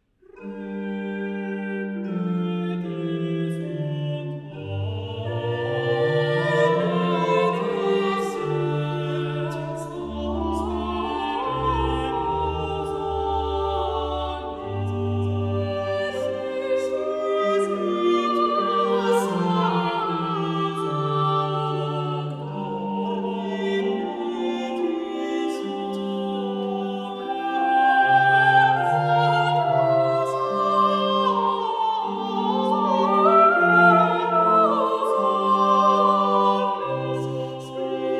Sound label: Motets, motet a trois voix et continuo; Repleti sunt omnes; Klassika; Gregorio Allegri; Miserere - Messe - Motets